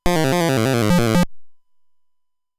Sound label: Warp Sound